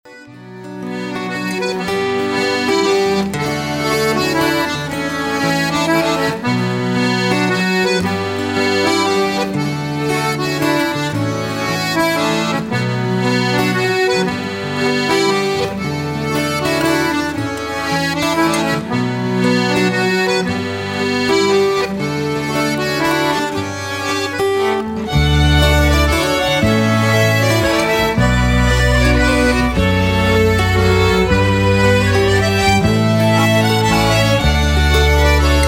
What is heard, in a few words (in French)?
mazurka